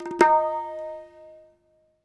hi flick, tabla; Dirt-Samples

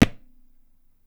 tabla; Dirt-Samples

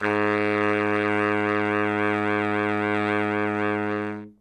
notes g sharp, sax; Dirt-Samples

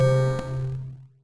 melody

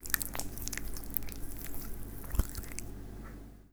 hand mono

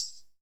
perc; Super-Dirt project

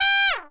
trump stab g

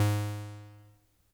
bong; sundance

